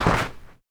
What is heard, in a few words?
Snow